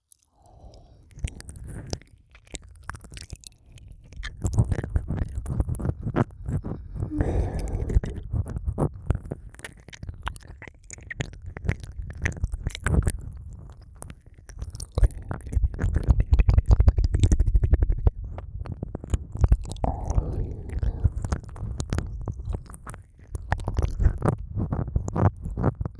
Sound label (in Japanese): 左耳舐め